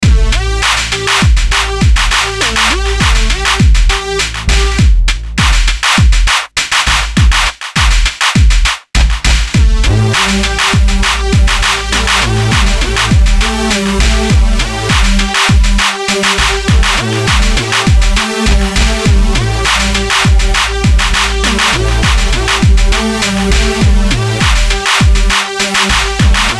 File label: BEAT DANCE SYN, Beat Dance Synth Lead; Miscellaneous Loops